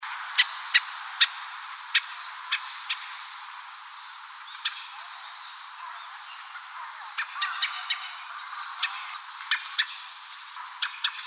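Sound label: tugumi C, dusky thrush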